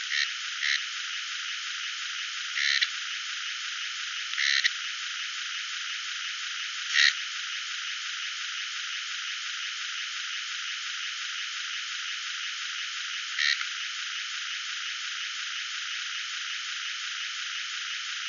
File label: Scinax sp